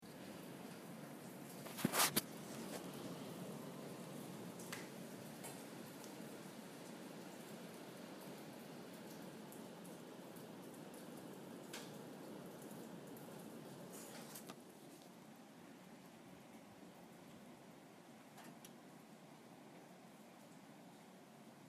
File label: Windy; Location: NYC, 55th Street